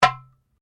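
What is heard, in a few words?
Drum Darabuka Slap piano, Egyptian Darabuka Drum; Commanly available Egyptian Darabuka Drum; Drums; Sound Effects:Musical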